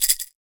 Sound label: PEYOTE, PERC; AKAI S6000 CD-ROM - WorldSounds